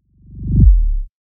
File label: FX